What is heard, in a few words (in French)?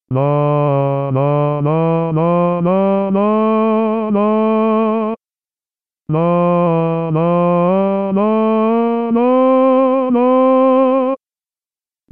sarabande basses; Basses accompagnées; mesures de 17 à 25